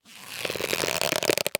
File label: Latex Glove; Blastwave